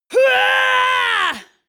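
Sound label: female combat attack long, screams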